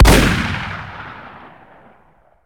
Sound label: medi shotgun shoot